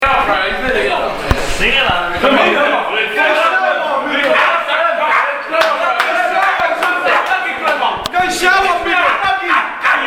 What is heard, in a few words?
team Song; bulldogs; 2011 nswrl